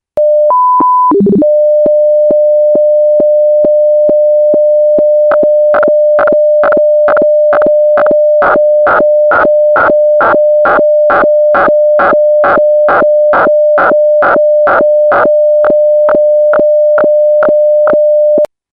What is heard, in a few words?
sstv m1